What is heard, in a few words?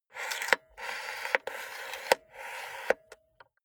cassette player antenna extend slow